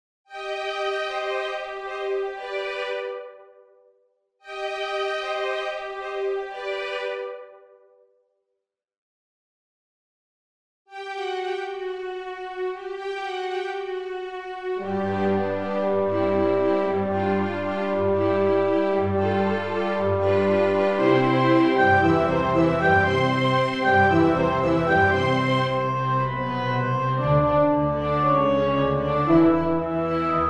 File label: only orchestra